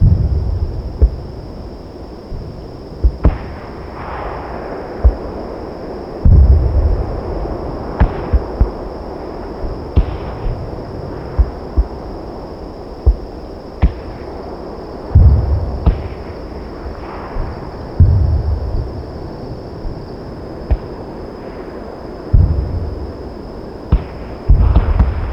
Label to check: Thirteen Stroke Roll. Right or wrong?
Wrong